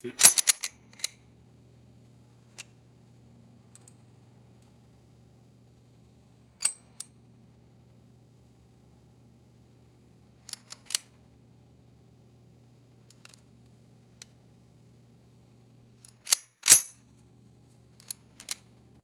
loading bullets into a revolver cylinder; guns

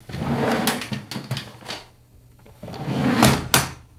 kitchen cutlery drawer sliding open and closed; objects

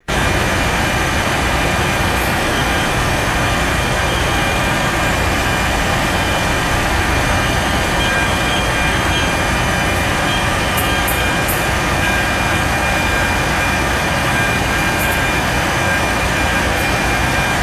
heavy machinery reverse warning beeps

